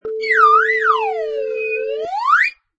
Slide Whistle Yo Yo Sound

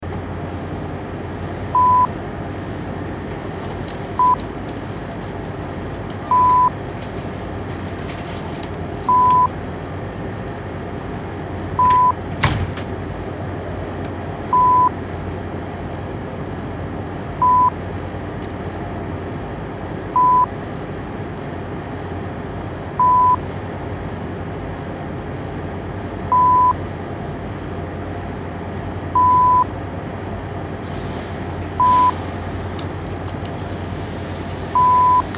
On Train In Station

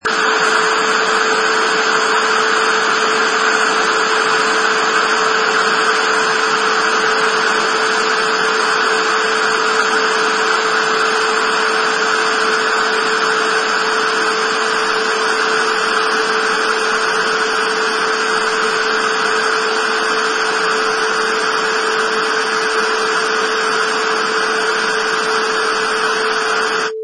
Television Static

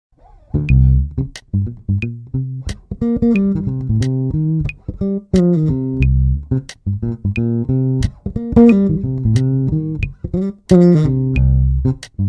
Cm7 fill lick

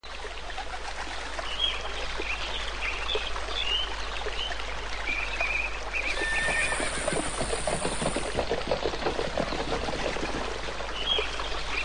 Babbling Brook

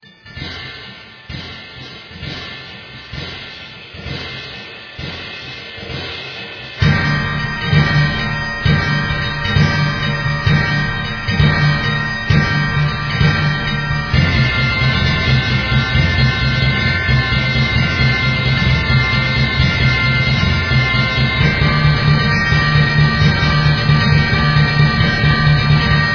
Manic Percussion

